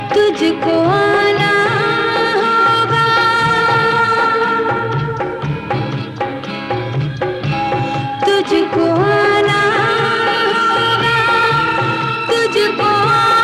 female

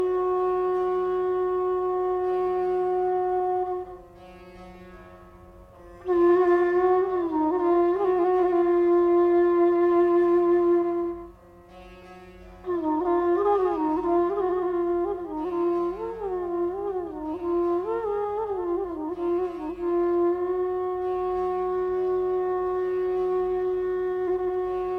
Raag Aiman - Flute

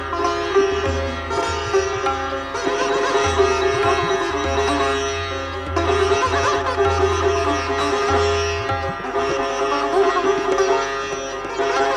Raag Marva - Sitar; raag marva on sitar

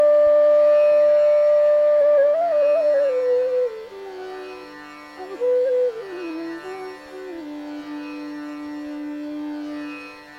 Raag Koshak Dhani, flute; classical raags on flute

